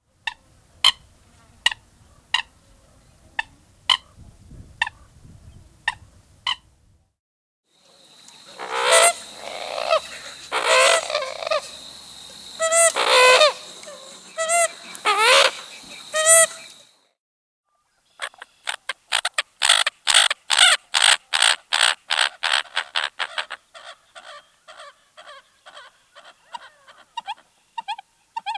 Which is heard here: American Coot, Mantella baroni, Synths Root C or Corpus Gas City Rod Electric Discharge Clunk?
American Coot